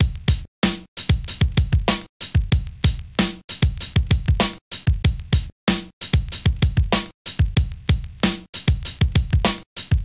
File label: Drums; Frogger Drums; Ableton; Breakbeats by KutMasta Kurt